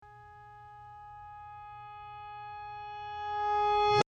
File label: piano